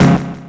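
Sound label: percussion FX, Dance Drums; Best Service; Dance Mega Drums CD2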